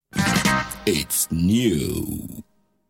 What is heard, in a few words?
Jingle